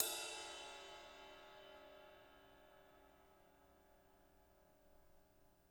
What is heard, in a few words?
RIDE, RIDE CYMBALS; Spectrasonics - Backbeat